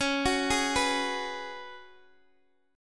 C sharp 7th Suspended 4th, C sharp, Guitar, Open D; down; chord shape